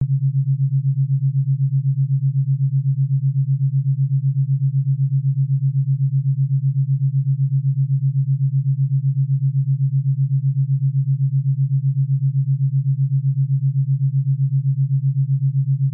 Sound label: low alpha, Binaural; JetCityOrange